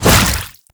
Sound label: Shield Swing; Shield Lancer; Warframe; Lasrian Soldiers; Weapons